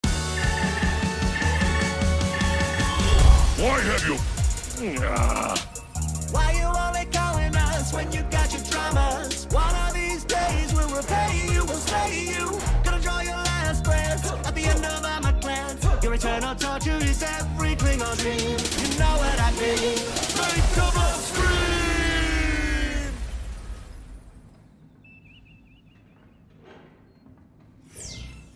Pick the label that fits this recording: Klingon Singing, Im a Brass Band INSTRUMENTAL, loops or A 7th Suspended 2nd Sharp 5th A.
Klingon Singing